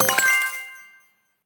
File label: New Card; merge military